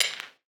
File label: drop one shot vinyl hihat